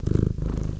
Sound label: CAT PURR; Greytsounds VOL-1